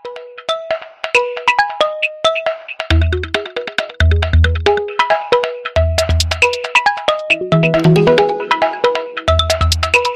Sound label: Oppo A53